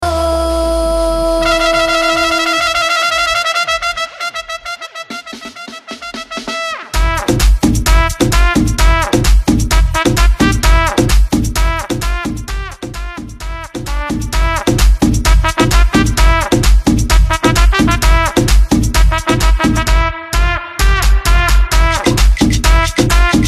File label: SABADANCE GUARACHA MIX; 27 mins set